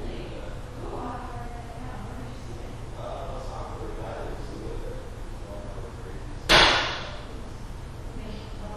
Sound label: Bang womens bathroom; EVPs; Tavern; GHOST GIRLS